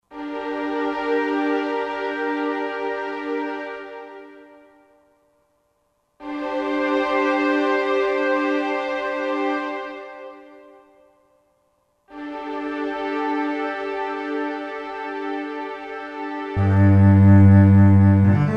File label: Solo & Acomp, Roland SR-JV80-16 Orchestral II